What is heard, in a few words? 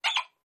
Horns 1, Various Party / Bike Horns, Short.